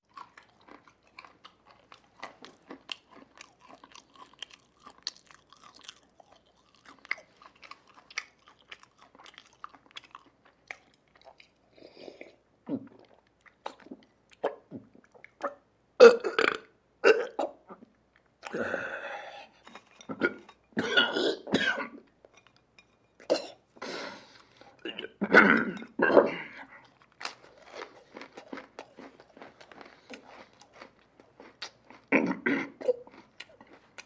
Eating Man Interior Kitchen Close Perspective Sloppy; Human Sounds